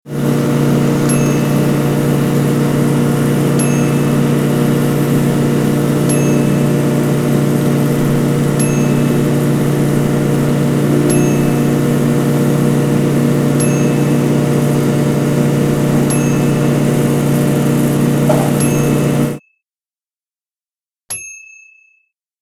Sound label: Push Lawnmower